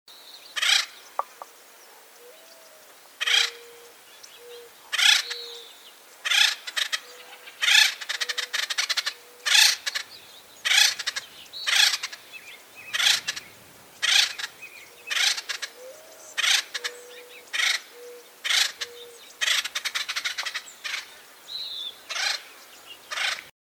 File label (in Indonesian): burung pelatuk acorn suara panggilan